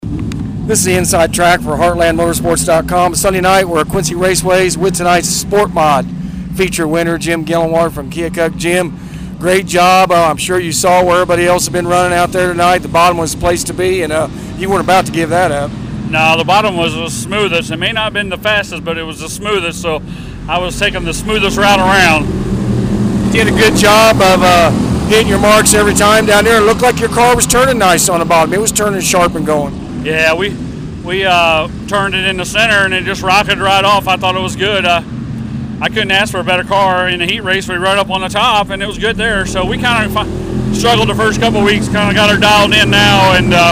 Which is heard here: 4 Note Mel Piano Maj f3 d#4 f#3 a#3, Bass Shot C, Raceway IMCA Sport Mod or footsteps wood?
Raceway IMCA Sport Mod